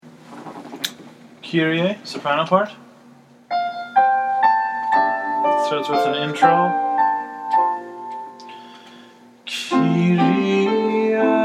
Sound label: soprano; kyrie mass xvi